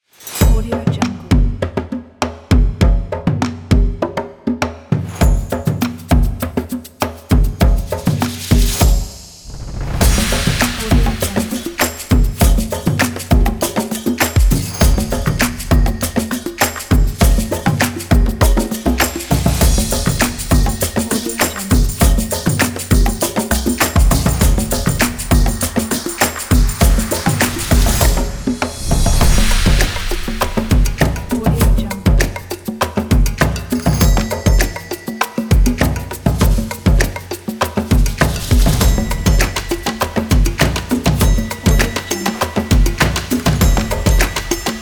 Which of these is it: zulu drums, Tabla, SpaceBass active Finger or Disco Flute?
zulu drums